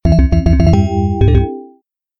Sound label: wall; games; Fenix Play 27; Wazdan